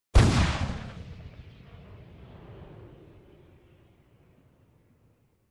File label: Shotgun shot sound